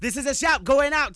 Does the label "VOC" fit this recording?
Yes